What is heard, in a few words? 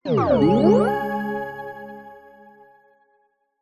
multimedia game tone short positive complete